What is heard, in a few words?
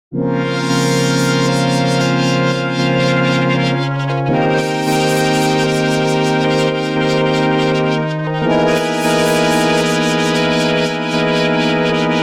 Pad